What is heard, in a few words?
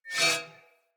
Short Sword Whoosh Accent; Weapons; Accents